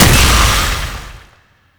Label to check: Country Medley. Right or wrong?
Wrong